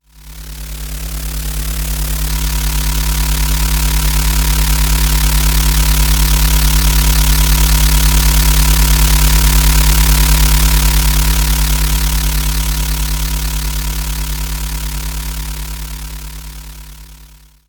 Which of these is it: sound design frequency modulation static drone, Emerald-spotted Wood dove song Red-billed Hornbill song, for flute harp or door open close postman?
sound design frequency modulation static drone